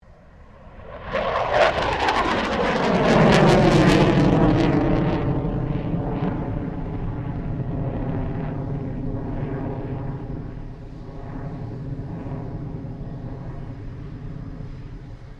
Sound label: FA-18 Hornet pass; RAAF FA-18 Hornet; Airplanes (Sound Effects:Vehicles)